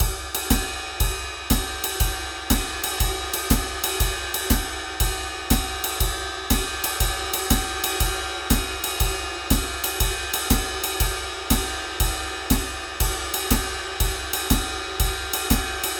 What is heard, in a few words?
drum loop jazz swing 120; guitar looper